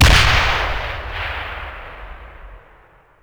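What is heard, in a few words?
bazooka soldier fire; WAR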